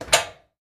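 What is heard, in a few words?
Washing Mach Open Door, washing machine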